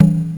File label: thunk mid; PlatinumPhattCD1; E-MU Producer Series Vol. 8 – Platinum Phatt; Phatt Combo